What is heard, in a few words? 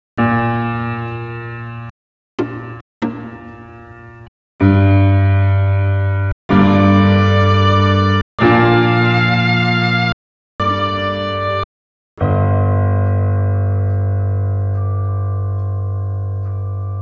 Chops